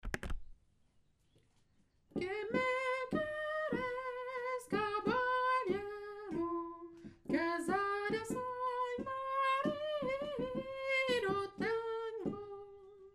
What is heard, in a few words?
quem quercis soprano; choeur femmes